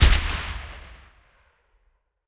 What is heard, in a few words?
apache 30mm gun outside shot